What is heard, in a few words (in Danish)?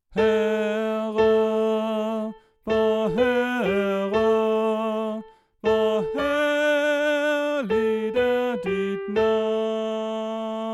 Sopran